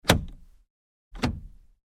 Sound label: Truck GMC Savana Switch Door Lock; 2010 GMC Savana; Trucks; Vehicles